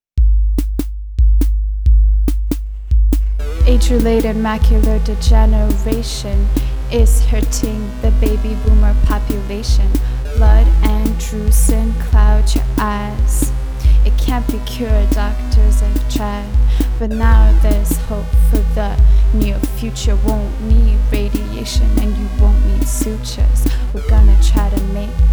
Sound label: rap